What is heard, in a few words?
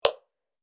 Futuristic digital switch turn on